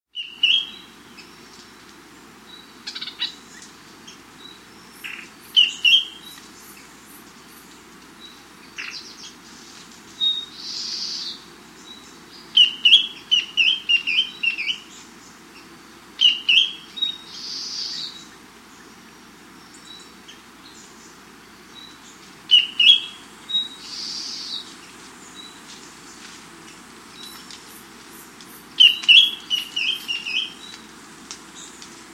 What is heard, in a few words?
Ferruginous Antbird; S. America